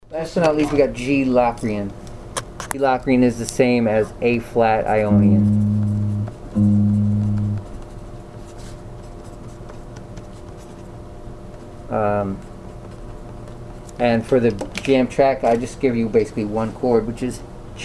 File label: modes with common root of g locrian